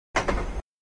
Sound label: Sngl Clack On Rail, track click